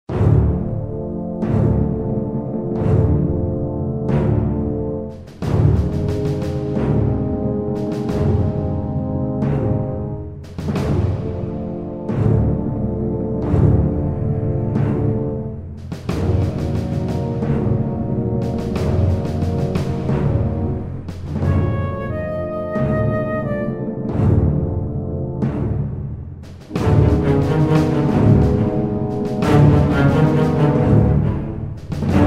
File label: menu theme; Call of Duty 2 Official Soundtrack; Xbox 360; Windows; gamerip